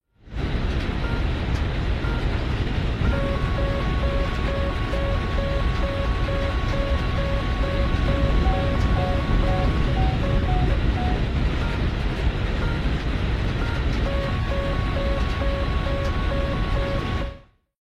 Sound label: war thunder rwr